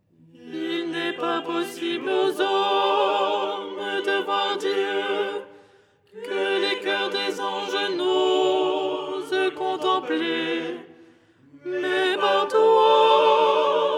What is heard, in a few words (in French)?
alto; 9ème hirmos du canon de la Pannykhide; Les 8 tons en français; ton 6